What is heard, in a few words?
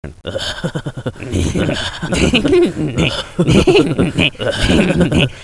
heheh; The World Is Not Enough; Pierce Brosnan